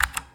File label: Vintage Keyboard